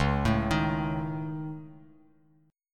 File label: C sharp Suspended 2nd Sharp 5th C sharp Bass Standard; C#sus2#5; arpeggiated; JGuitar